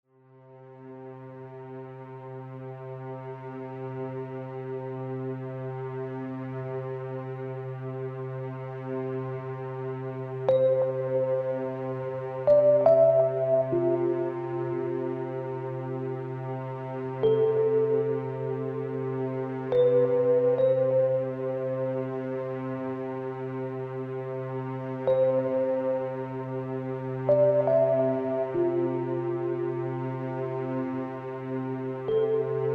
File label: Cinematic Hope - Orchestral Ending